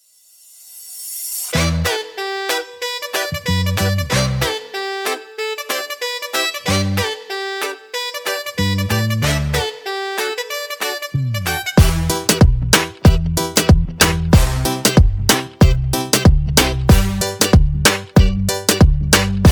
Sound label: Siren Beat Remix instrumental